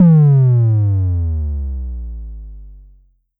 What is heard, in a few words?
Pollard Syndrum Sub